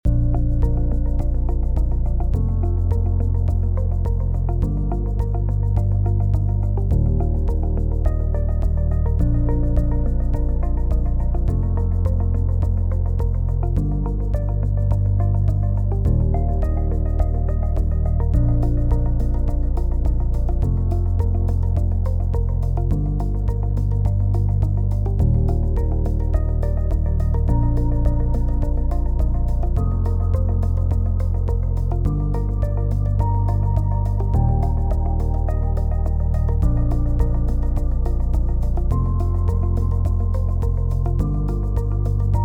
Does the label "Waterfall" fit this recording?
No